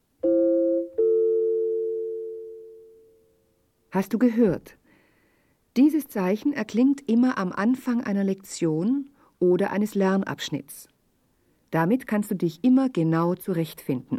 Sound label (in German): Spiel Blockfloete